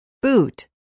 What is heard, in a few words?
boot